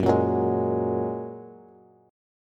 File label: Gb Major Add 9th, down, Bass; strummed; JGuitar